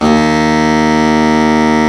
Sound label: BARITON SAX